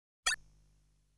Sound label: Shot sound; Legend of Hero Tonma